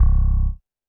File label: Roland TD-20 Percussion